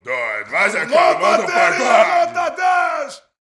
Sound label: BRAZIL, World Voices